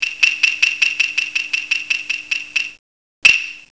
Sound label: hyoshigi